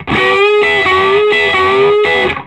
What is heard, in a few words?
SOLO E, Vintage Blues Guitar; USB Soundscan vol.22; AKAI; SOLO E125